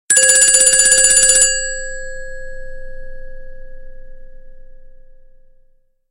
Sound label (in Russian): sms zvonok; prikolnoe sms dlya vashego telefona